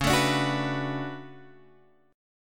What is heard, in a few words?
D Major 13th C sharp, chord